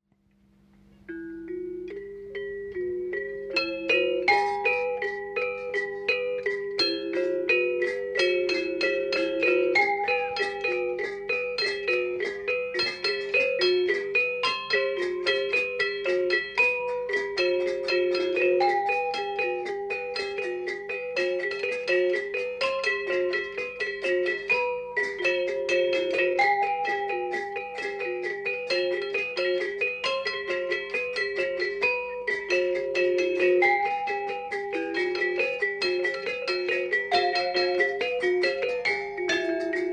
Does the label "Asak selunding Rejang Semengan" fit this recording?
Yes